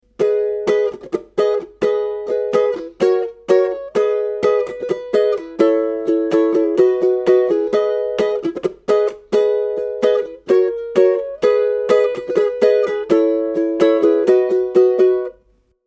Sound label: Middle Strum, ukulele